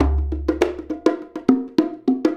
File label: Bonga, Percussion, Drums, Bongos; Essential Percussion